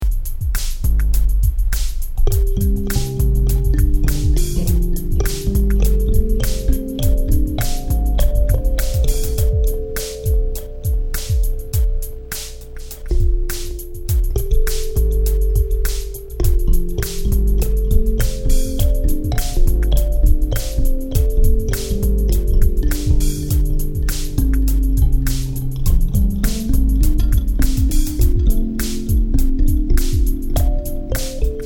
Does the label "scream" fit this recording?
No